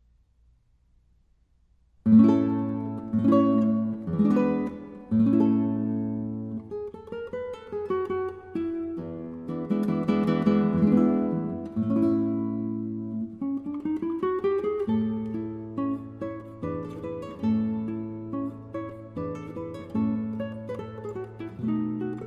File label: sor minuet, guitar